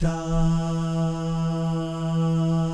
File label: DAH, jazz FX, mix guy gal